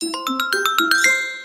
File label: Winner message